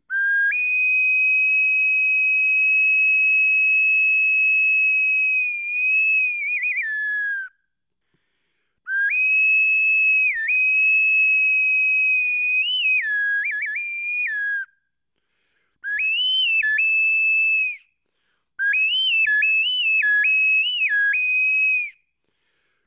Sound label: solo